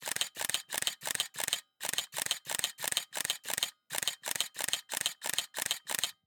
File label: Camera, Pentax KS2, DSLR, electronic, mechanical, snapshots, rapid, smooth, bursts, XY; Eiravaein Works; Vaeyan IV